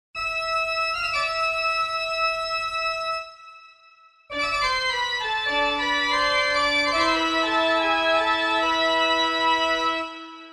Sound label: TUTTI, Church Organs; Universal Sound Bank Samploscopie Classic Vol. 1